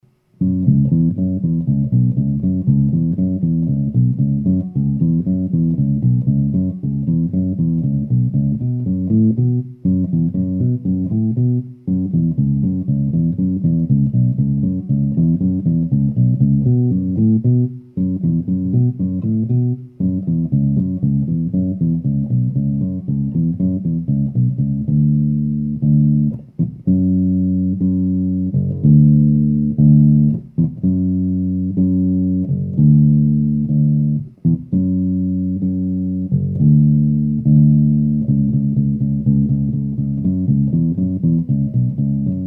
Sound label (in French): basse